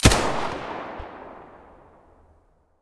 muzzle mp9 stereo, weapons

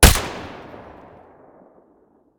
ak105 silencer mono, weapons; STALKER; IWP

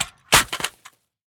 riffle drop grass; STALKER; IWP

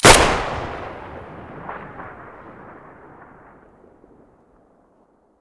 saiga muzzle stereo, weapons

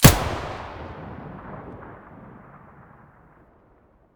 vihr muzzle mono, weapons

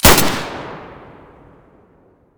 m9a3 muzzle stereo; beretta

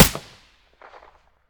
aps silencer stereo; STALKER; IWP

